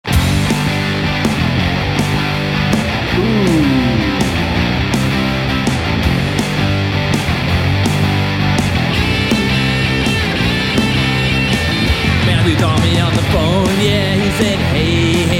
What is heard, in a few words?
chenese rock